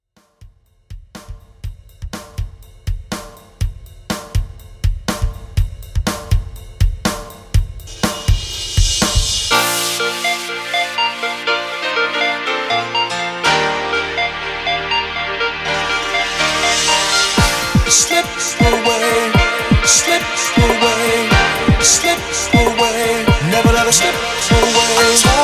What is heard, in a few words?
Re Drums 122 Bpm